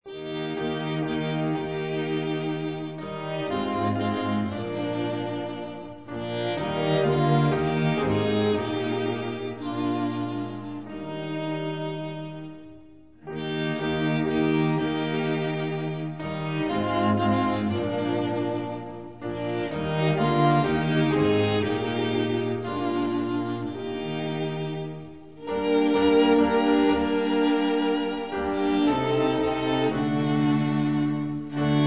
thy spirit lord has stirred our souls instrumental; hymns portuguese